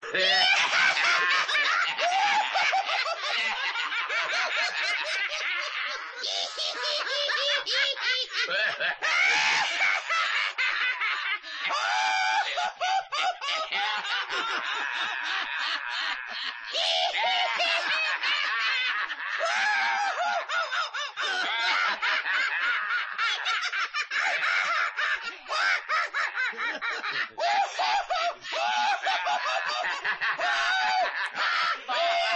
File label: Pirates Cave Laughing